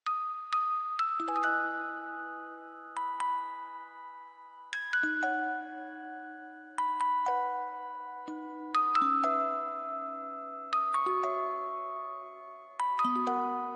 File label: music box ver.